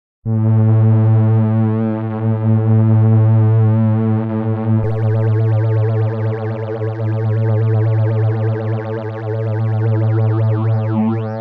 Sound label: Vox Humana